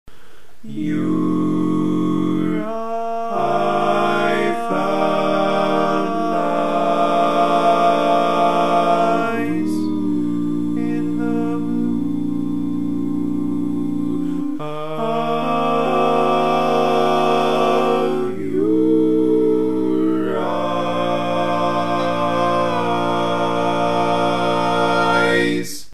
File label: Lead